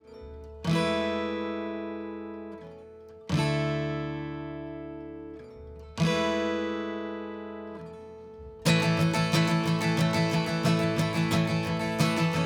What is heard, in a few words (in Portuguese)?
Violao depois; Click Turbo